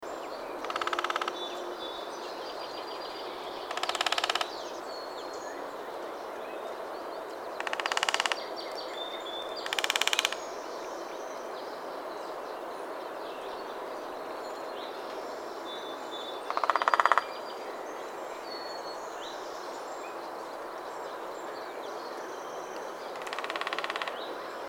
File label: Downy Woodpecker Drumming, California; Irvine OrangeCo; Frank Lambert